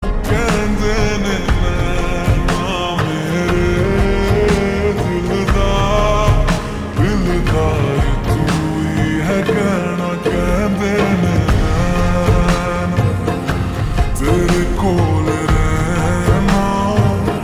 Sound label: Slowed Reverb